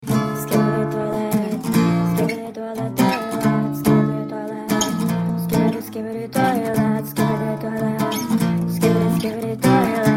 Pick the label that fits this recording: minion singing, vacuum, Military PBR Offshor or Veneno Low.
minion singing